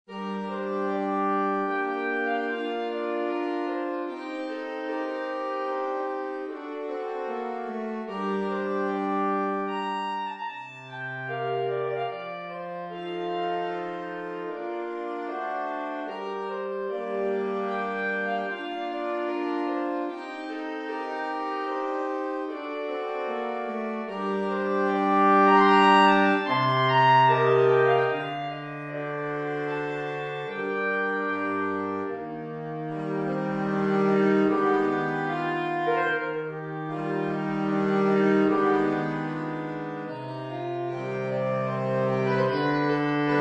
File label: Saxophone; Kalinnokov; Chanson Triste